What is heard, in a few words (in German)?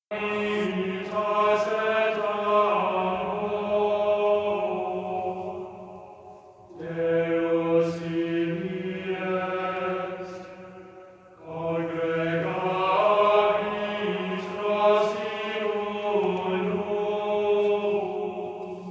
Zwischenmusik; kolpingsfamilie ankum